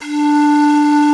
FLUTE

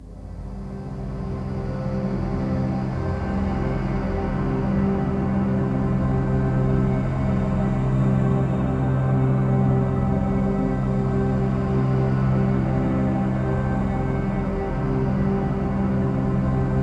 ATMOPAD; Best Service; XX Large; Pads

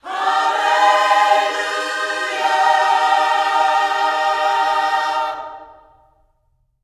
HALLELU, Gospel

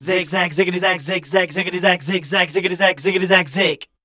SCAT #F, Voice

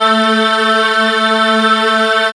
SWIRL; E-Lab; X Static Goldmine 1

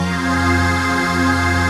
VOICE PAD, ATMO PADS; Best Service; XX Large

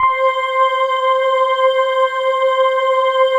pad, atmo pads; Best Service; XX Large

